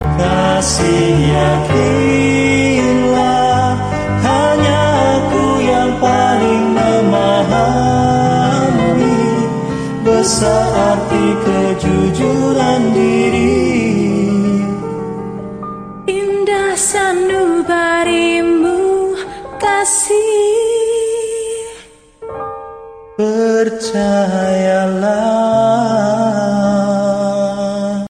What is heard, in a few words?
indonesian ringtone